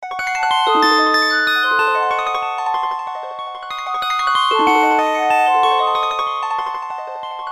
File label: wind note